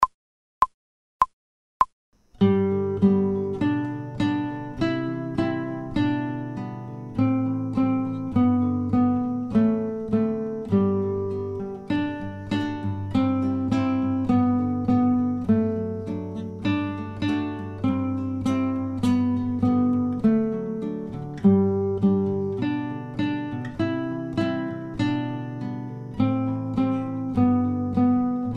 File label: Twinke Twinkle Little Star fast without metronome, guitar